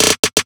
Trap Drum Fill

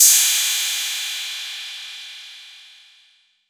PERC 808

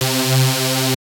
Harmor Resynthesized C

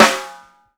CHROME T, CHRM TAMA; Sampleheads; New York City Drumworks VOL-1

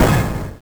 Orchestra Hit, Genesis; Sonic 3D Blast; M8

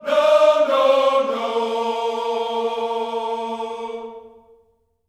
NO NO, Vocal Planet Gospel

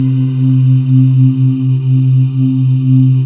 PAD, Synth Pads; Sound & Vision; Gigapack I CD 2; PAD SYN-PADs; PAD Synth-Pads 5

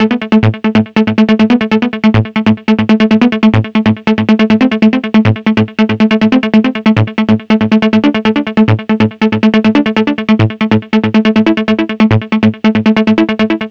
NRG Lead, trance

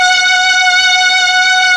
STR, STRING

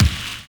RAIN BEAT; Univers Sons - Kitch 70s

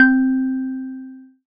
KEY C4, SCI Prophet-5 synth keys

